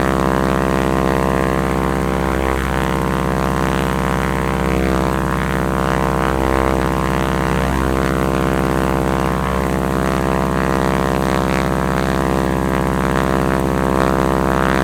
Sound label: plane; World of Tanks